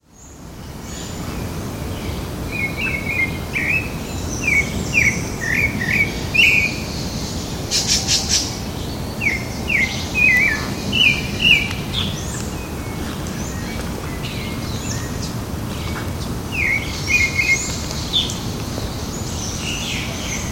Turdus leucomelas; I Butantan SP; Silveira

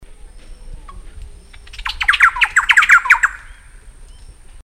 Campylorhynchus turdinus

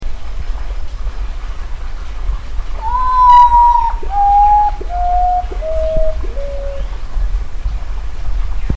Nyctibius griseus; Htl S Got; Itamonte; MG; Brazil; Silveira

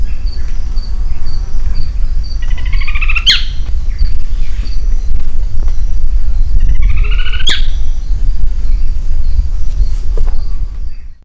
Rytipterna simplex; JD Bopiranga SP

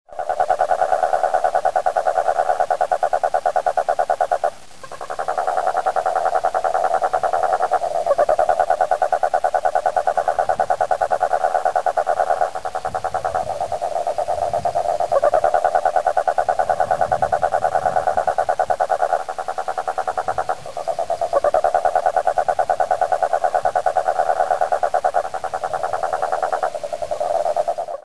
Rhinella schneideri; ex B paracnemis; Salobra MS; A Silveira

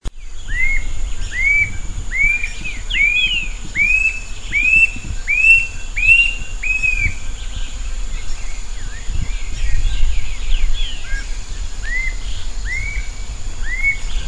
Attila rufus; Intervales S Park; Brazil; Silveira

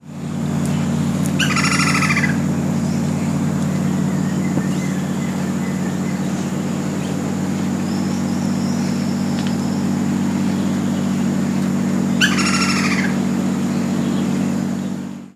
Dryocopus lineatus; I Comandatuba

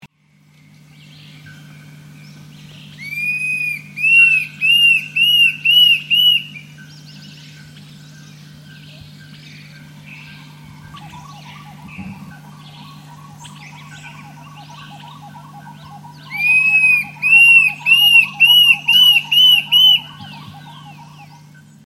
Mackenziaena severa; Rio Betari; Iporanga; SP; Silveira

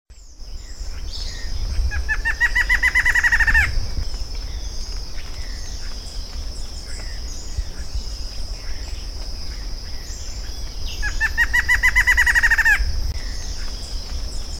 Thamnophilus palliatus; Ubatuba; Silveira

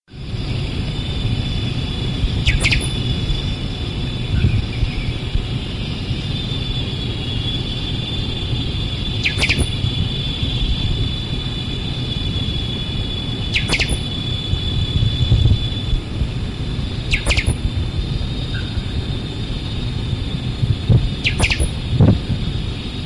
Sporophila frontalis; Itap da Serra; Brazil Silveira

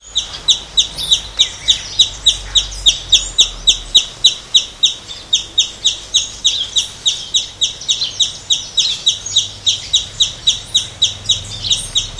Aphantochroa cirrochloris; Guarau Peruibe SP BR; Silveira

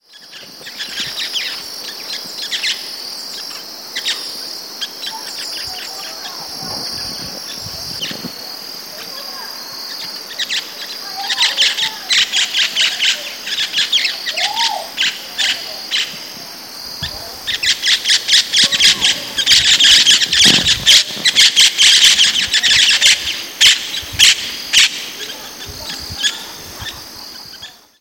Pionus maximiliani; N Cunha PESM; Silveira

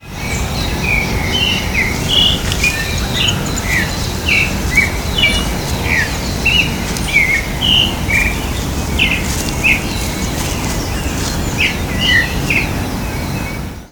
Turdus amaurochalinus, Ibirapuera Park; SP; Brazil; Silveira

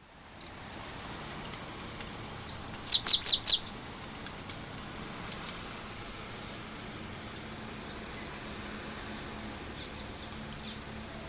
Dendroica petechia, Aruba; Bubali Plas

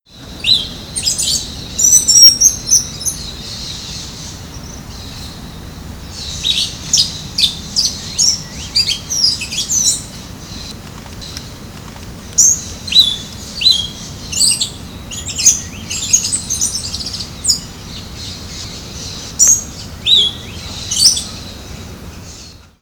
Turdus flavipes; Int Butantan; Sp; Silveira

